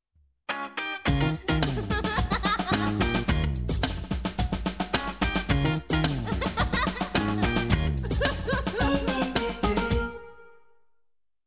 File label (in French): rires